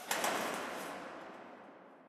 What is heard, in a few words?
amb wing flap, birds; video games; ambient; nature; source engine; portal2